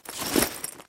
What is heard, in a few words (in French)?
sac, bruit; deadmaze